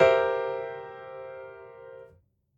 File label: piano sus